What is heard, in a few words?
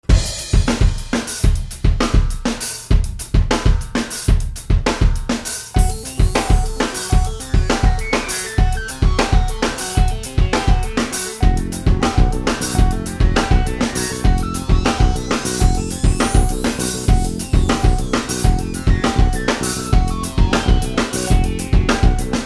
chicken; Mechanically Separated Chicken